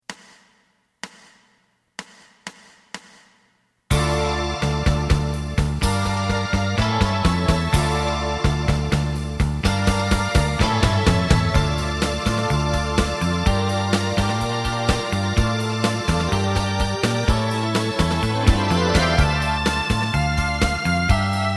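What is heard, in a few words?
lead guitar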